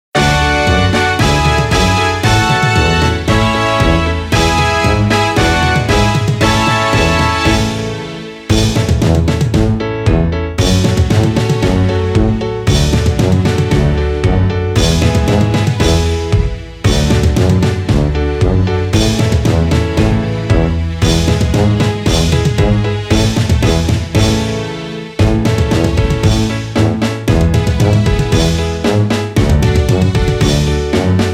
himno pista; quito ecuador

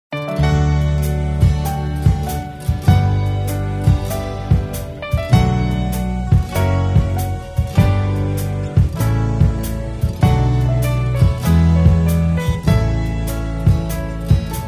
pista, pistas musica latinoamericana

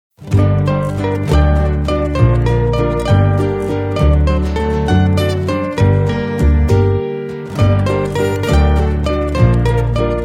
pista, pistas musica latinoamericana

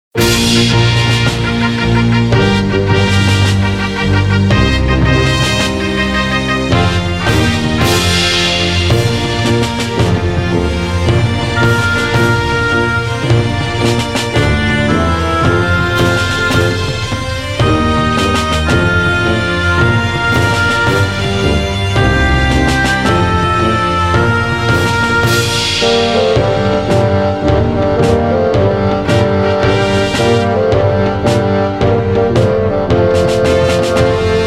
himno instrumental; la nueva estancia; bogota colombia

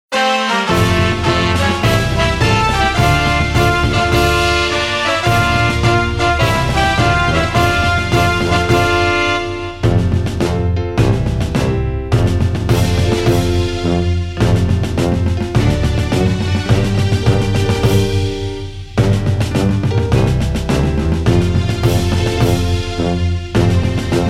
himno pista; a juan martin moye; quito ecuador

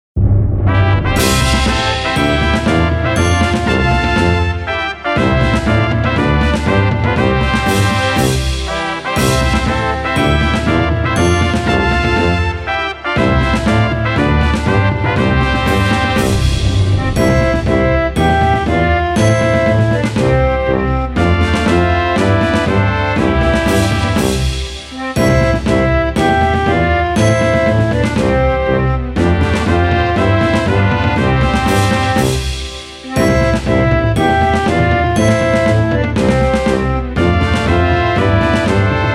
himno instrumental; a san rafael; medellin colombia

